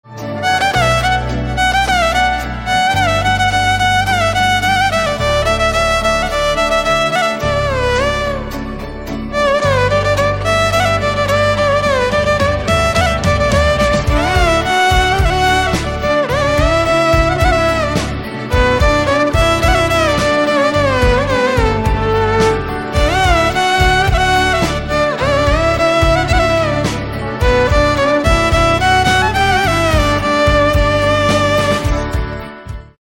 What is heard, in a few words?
violin cover